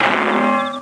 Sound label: anvil; Crash Bang Boom